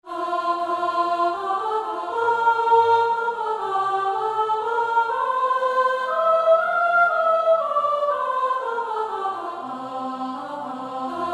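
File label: Would I might be hang'd! Soprano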